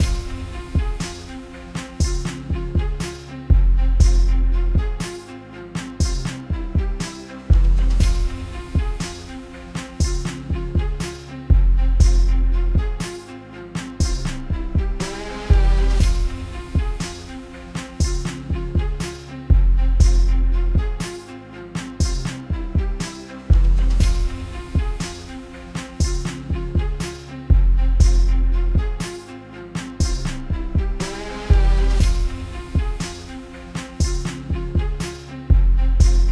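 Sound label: free royalty free instrumental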